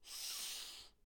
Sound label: Girl Sniffing A Harsh Dry Sniff